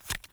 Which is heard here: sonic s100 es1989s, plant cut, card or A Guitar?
card